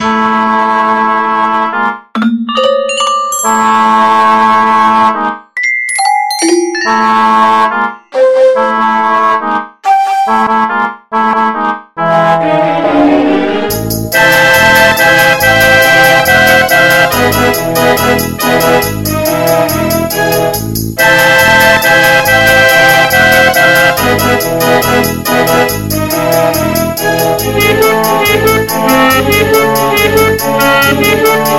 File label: Level 1 Symphonic